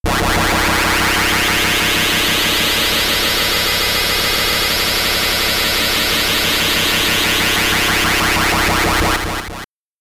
Teleport; Speris Legacy; Amiga

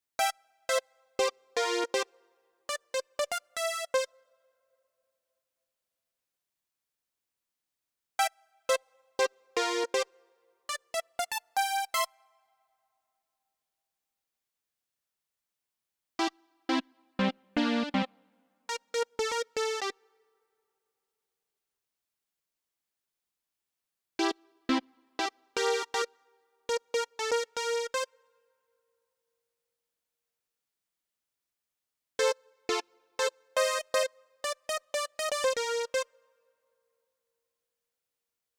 melody

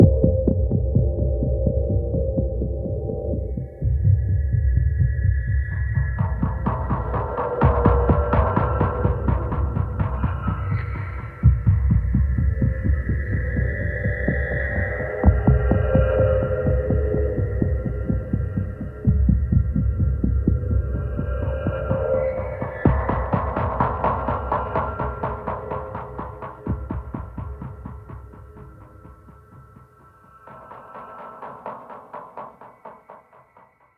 Sneak

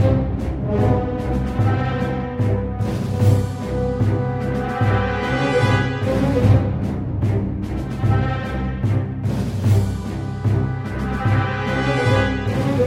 Comic Action Music; android game music; angry birds star wars

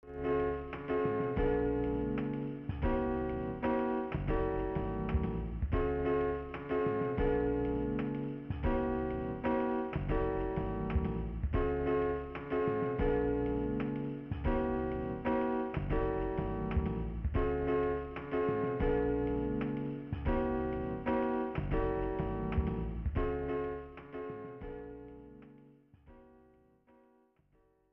Elevator Music; Jurassic Park; SNES

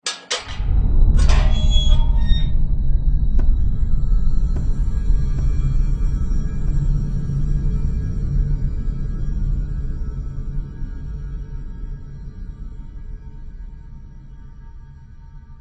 Jumpscare Crate door; Don't Be Afraid The First Toy

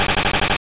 Sand Attack Loop; sfx; attack moves; pokemon; gen 2; gsc

